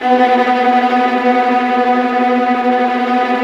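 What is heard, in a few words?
STR Viola Tremolo; Roland LCDP08 Symphony Orchestra; STR Vas Bow FX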